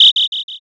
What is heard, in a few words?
whistle short